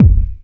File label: bass drum, Drum & Bass; Ueberschall; Drum & Bass Resonance